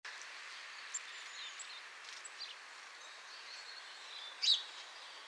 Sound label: Cyanistes caeruleus; SETE